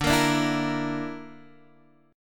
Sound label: D Suspended 2nd Sharp 5th D Guitar Standard; x,5,8,7,5,6; strummed